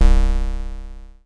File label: Pulse Bass - C2, Synths; Multisamples; Ableton Live 12 Lite; Core Library